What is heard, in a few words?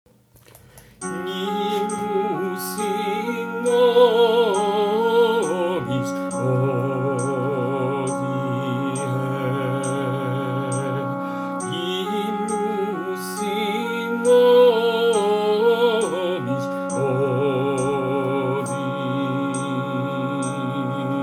Contralto